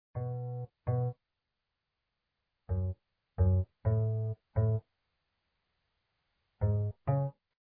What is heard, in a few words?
Hallway Bass; gamerip; Papa Louie When Pizzas Attack